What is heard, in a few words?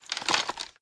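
inspect movement, weapons; Valorant; stinger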